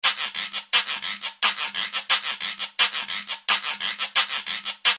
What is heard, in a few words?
guiro; lateinamerika